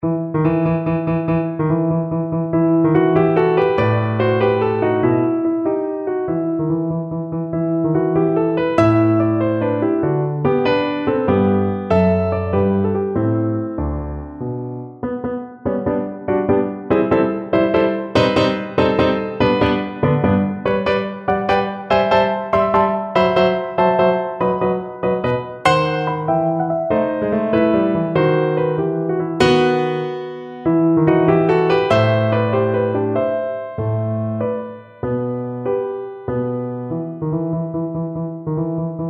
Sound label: Serenade, piano; Elgar